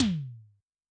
Electrc M, Tom, Yamaha DTXpress-II

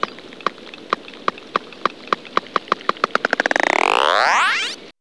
dolphin, sub aqueous; Zero-G Ambient 1

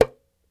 Dumbek Mute, World Percussion One Shots; Sony CS

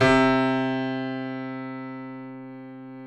C 2, YC7 piano, mf; Roland L-CD701

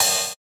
Cymbal, JUNGLE BREAKS DRUM SOUNDS; ORIGINAL JUNGLE BREAKS; JBK CYMBALS

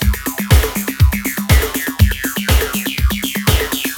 ROSO S LOOP, breakbeats

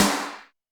Snr Alloy, Alesis D4

